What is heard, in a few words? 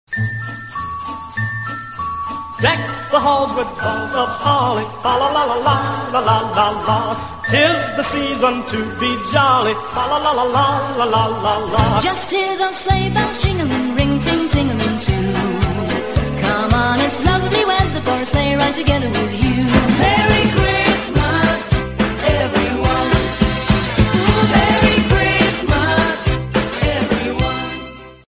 cool christmas, ringtone